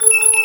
DG WAVE, DIGI SYN; AKAI S6000 CD-ROM - Volume 1; SAMPLE WAVE MIXING 1

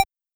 Low beep, Casio VL-1; Drums

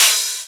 Open Hihats, Cymbals

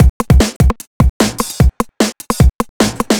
150 Breaks Full, Drum Loops; Zero-G; Soundclash Nu Dance Electro; Acidized Wavs; 150bpm; Breaks 4 Love

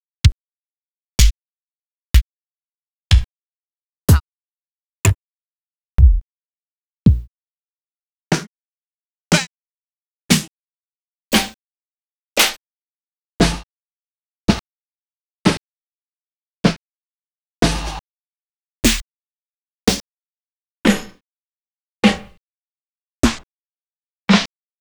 Vinyl BD SD; X-Static Goldmine

